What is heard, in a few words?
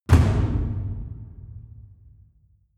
drum hit hollow small